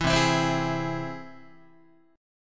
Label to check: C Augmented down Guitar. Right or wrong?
Right